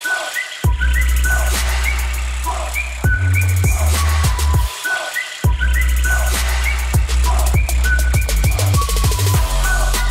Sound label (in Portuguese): Assobio WhatsApp Remix; toque de celular